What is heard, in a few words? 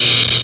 lt saber hit, sabre laser; effets speciaux